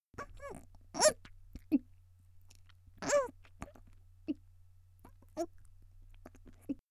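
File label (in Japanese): 少女ゴックン（3回声付き）; 元気な少女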